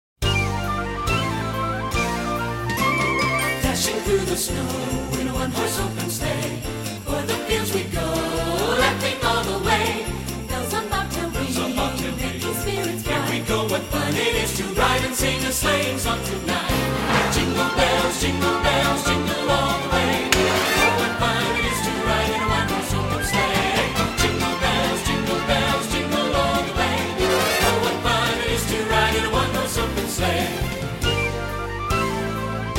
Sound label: Jingle Bells; Christmas Specials